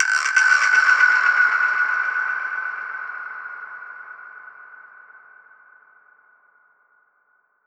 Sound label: Perc Hit, dub percussion; musicradar